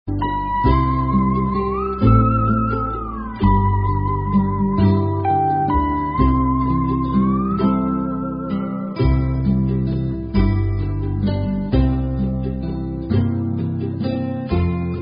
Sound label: Three-Part Round Instrumental; Girl Scouts